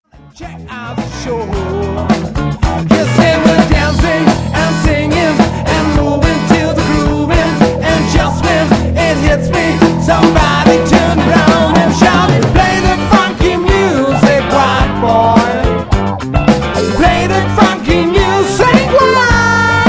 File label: funky music